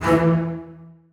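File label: basses staccato d#2; Sonatina Symphonic Orchestra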